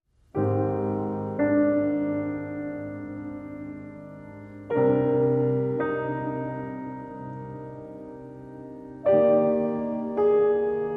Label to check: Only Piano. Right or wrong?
Right